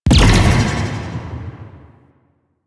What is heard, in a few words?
Glaive Air Explosion; Enemies; Weapons; Warframe; Teshin; DE